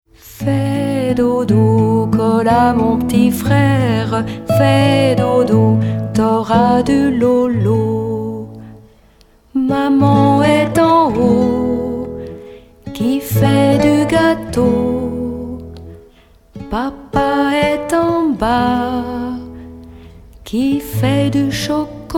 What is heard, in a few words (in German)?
Kinderlied; französisch